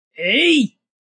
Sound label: Yell Samurai Ei, Male yell, samurai warrior war cry 2, (human voice); Yelling Sound Effects